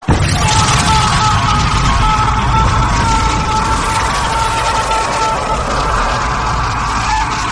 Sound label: scream; vegeta